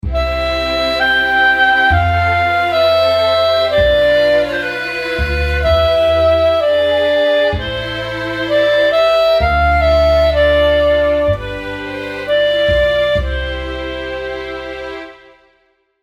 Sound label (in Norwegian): arr m variasjon alle